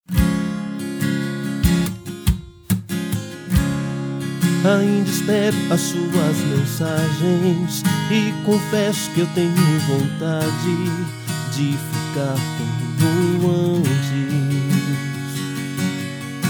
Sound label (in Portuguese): sertanejo